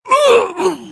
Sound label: male pain hard; firefight